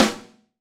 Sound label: St Snare, Roland BK-5; Snares